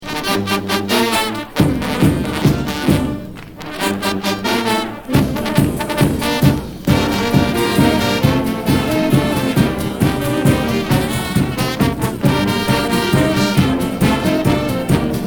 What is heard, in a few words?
fanfare